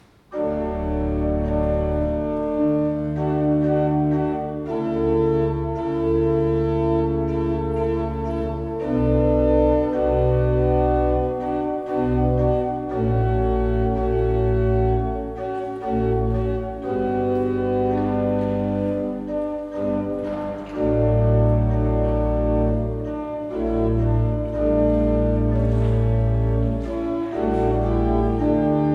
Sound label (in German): Postludium Ostinato, orgel